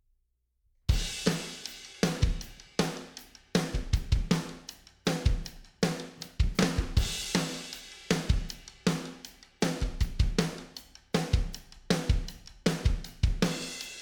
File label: drums